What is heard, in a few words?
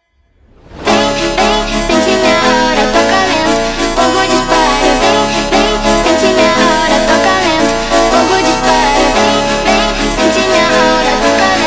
slowed, funk